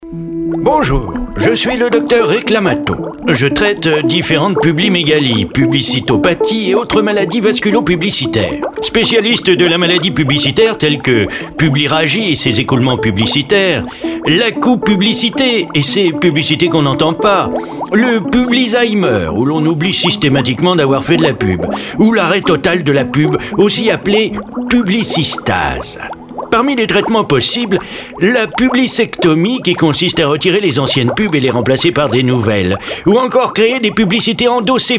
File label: jingle; auto promo